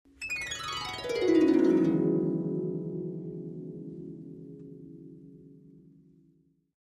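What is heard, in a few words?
Harp Descending Gliss Short; strings harp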